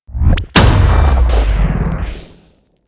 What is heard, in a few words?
Heavy Tank Railgun; blue archive